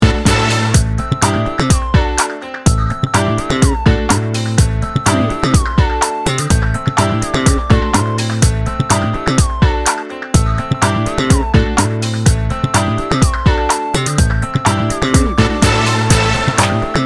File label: downtown funk